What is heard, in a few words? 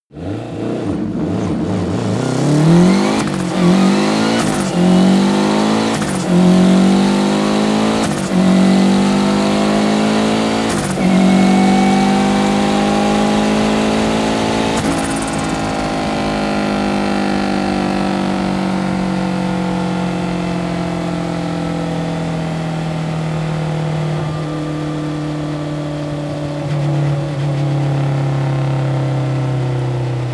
car lancia delta fast acceleration full deceleration